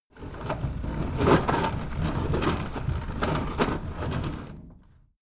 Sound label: window roller shutter close fast